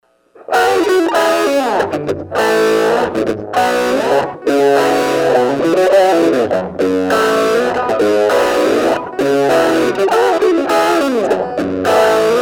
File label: HD DSP with Dist 2